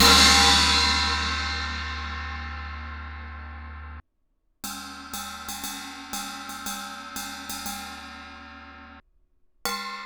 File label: meinl 16 inch generation x filter china crash ride bell bell up